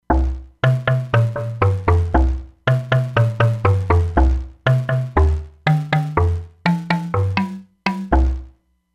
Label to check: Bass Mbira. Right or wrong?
Right